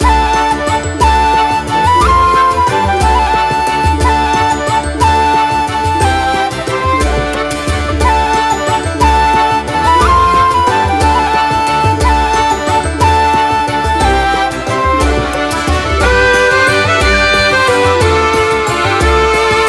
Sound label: Pastorale loop, BGM